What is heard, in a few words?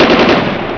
MGUN; DOOM WADS